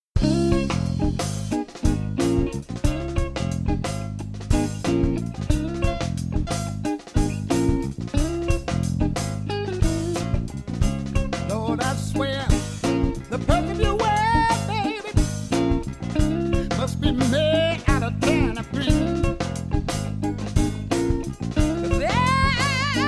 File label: funky groove